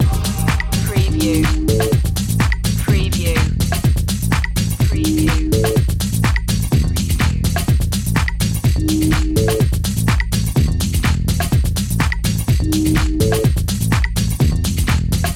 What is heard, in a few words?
funky changeling loop